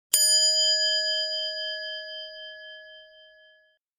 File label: chiiiinn